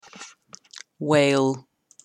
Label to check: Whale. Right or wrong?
Right